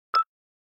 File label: default beep